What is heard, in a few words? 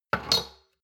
shot glasses stack slide medium; soundpool